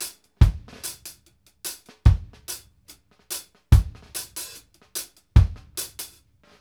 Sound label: KICK, KD REGGAE 72; Sampleheads; New York City Drumworks VOL-1